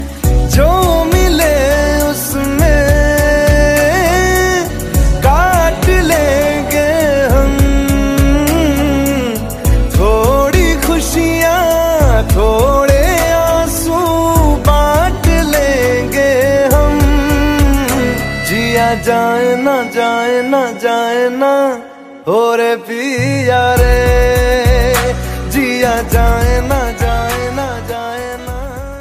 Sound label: punjabi ringtone